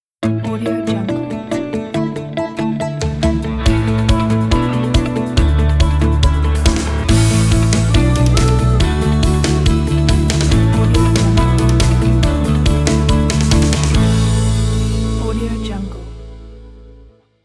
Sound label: logo positive vibrations